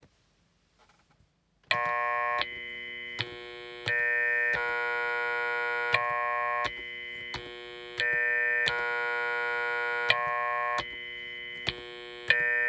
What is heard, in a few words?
Vowel Synth Sample